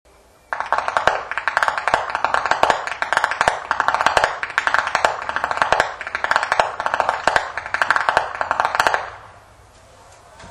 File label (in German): schnalzen, flachgau; anthering